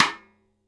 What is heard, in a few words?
rim, Drums Acoustic; USB Soundscan vol.10; AKAI; 90s sample CD